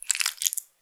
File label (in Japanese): 膣外射精